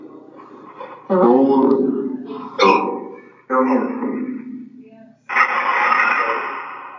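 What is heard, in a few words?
Train Station Phasma Box; Chessies Room; Mars Train Station – Return Investigation 2024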